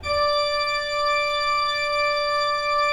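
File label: ORG D5, KEYS; Synclavier Sampler Library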